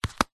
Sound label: wallet close; foley; zapsplat